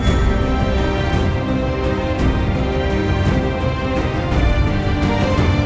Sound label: Classical